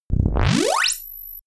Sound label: robot turn off turn on